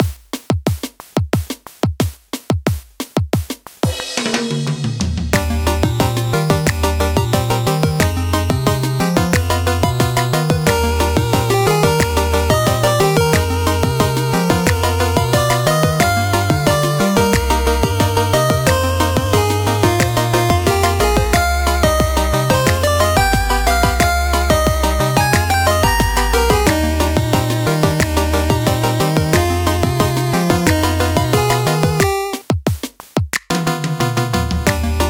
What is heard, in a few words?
subgame rehearsal sync swimming